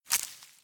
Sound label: harvest plant